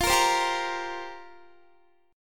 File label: F Suspended 2nd Suspended 4th F down Guitar; Fsus2sus4; strummed